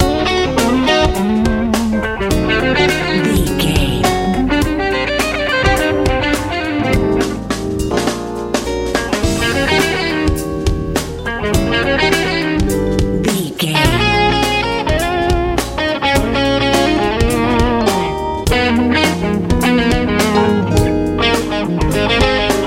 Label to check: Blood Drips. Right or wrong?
Wrong